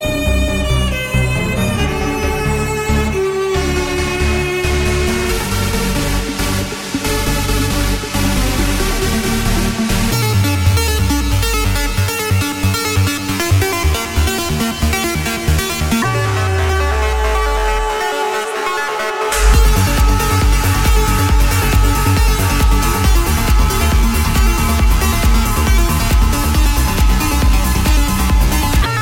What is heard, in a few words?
Trance Melody